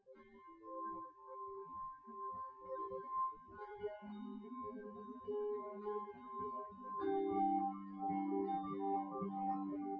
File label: nodrums synthconv; SiSEC08; SiSEC underdetermined